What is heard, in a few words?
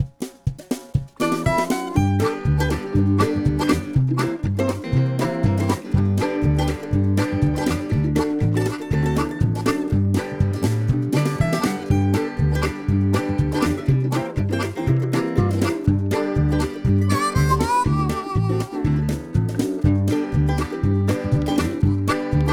JINGLE Instrumental; WKXY; ANNS FISH HOUSE